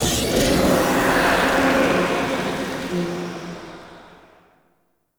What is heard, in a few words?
Sand Vortex Stop; Gemini; Warframe; Entrati